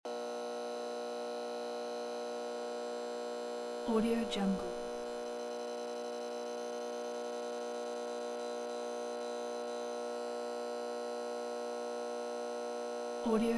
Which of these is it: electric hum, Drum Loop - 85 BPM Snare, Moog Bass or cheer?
electric hum